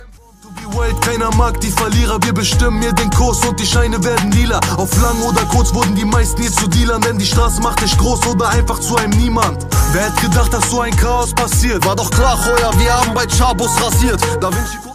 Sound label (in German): deutsche rington